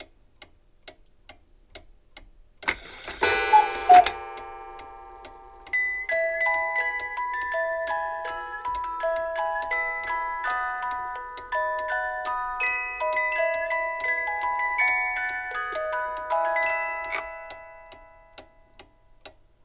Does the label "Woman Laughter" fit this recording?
No